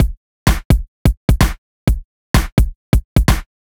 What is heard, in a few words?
Kick Clap, EDM; Sonic Foundry (Sony Creative Software); Afterhours EDM; Retro Fit